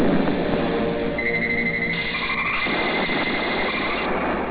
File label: monster